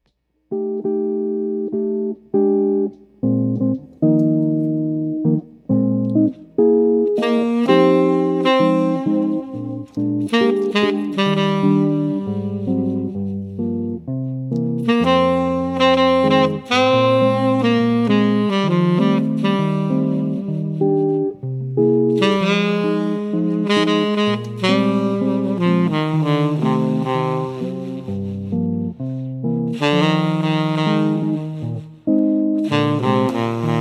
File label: Duo Sax and Guitar; Cocktail/Dinner; Music to move the night along, engaging but mellow enough for casual conversation.